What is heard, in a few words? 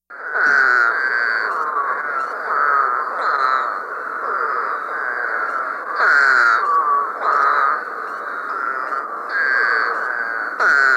Eastern Spadefoot call